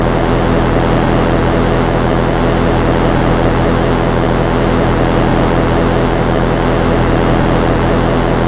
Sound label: hum